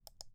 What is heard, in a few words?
Single Click Mouse